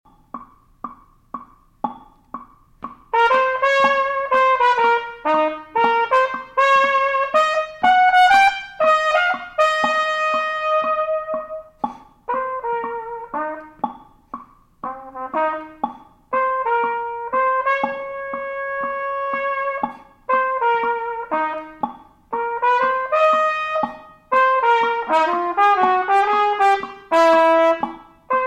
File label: jazz trumpet swing